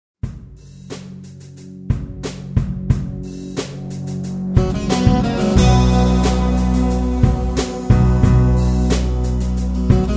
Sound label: Acoustic Easy-Listening